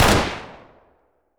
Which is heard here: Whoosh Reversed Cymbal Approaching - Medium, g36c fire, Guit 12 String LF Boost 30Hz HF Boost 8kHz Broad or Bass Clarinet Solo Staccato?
g36c fire